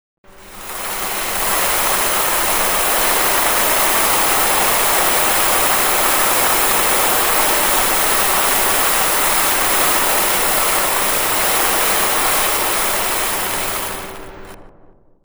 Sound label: rain